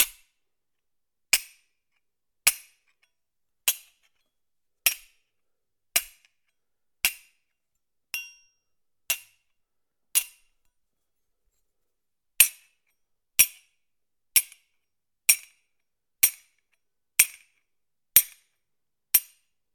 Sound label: Silver spoon cup